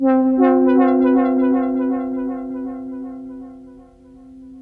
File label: Synths One Shots Tape Synth